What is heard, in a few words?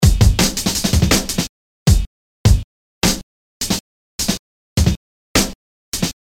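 drum melody break fast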